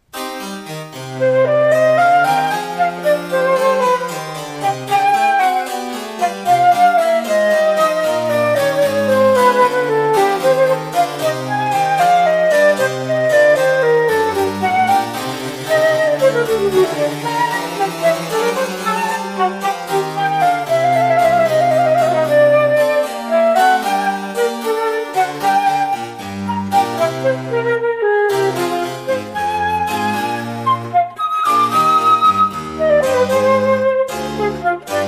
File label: bach trio sonata live rec